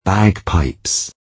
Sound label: bagpipes